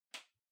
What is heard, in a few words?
Calculator (Far Switch)